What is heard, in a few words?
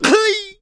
clown hit; Ringling Bros. and Barnum & Bailey; Wii